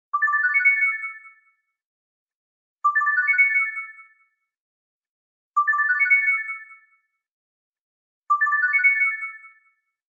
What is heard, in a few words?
Xperia S Incoming Cl